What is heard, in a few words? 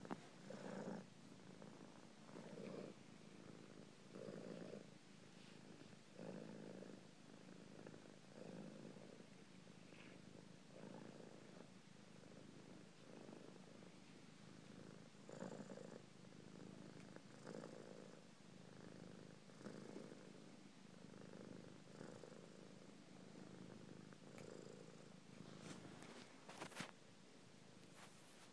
purring cat